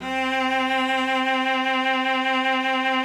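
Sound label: C4, cello vibrato; Xsample VOL-7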